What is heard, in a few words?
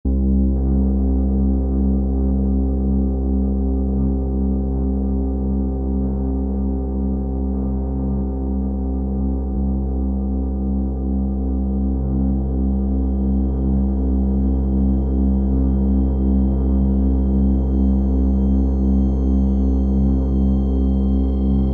Vietnamese Tritonic Ambience